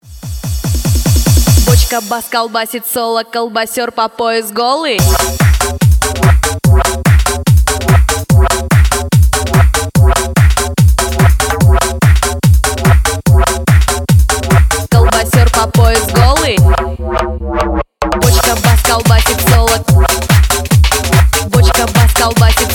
bass

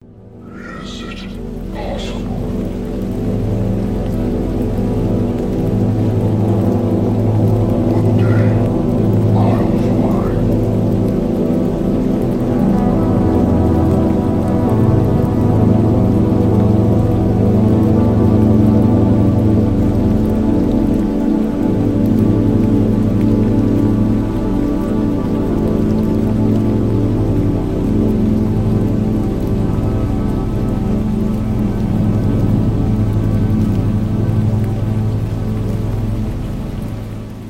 slowed reverb rain ambience city lights at night

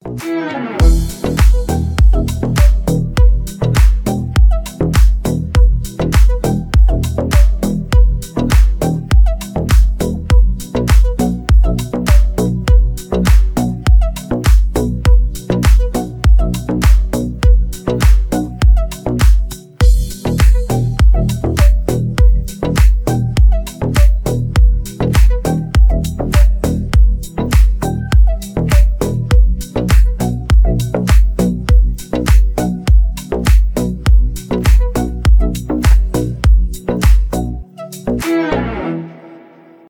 Angle Instrumental

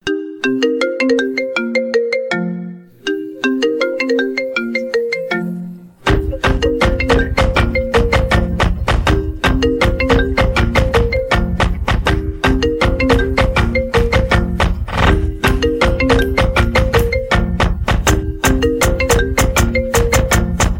instrumental

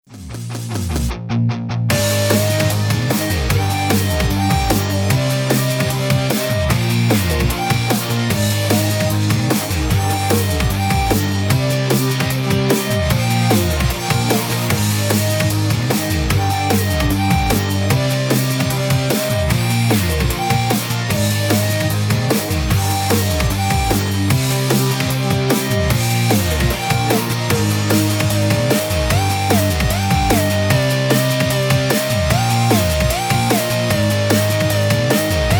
instrumental

